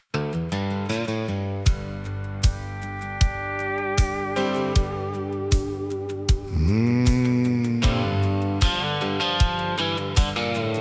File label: outlaw country